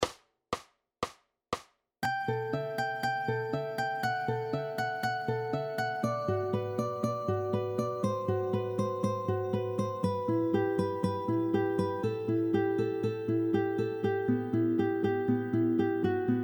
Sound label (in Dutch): oef tempo 120